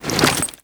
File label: foley step, soldier; combine; soldier common